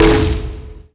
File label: cask bounce; witch doctor